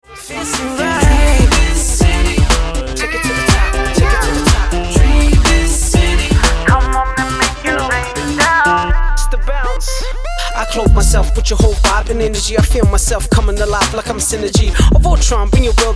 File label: Rap